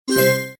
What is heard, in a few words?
collect; Just Jewels